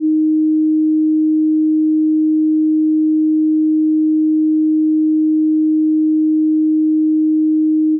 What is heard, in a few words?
voice memo, I sing